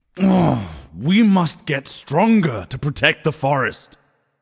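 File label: non verbal boohoo, voice; cernunnos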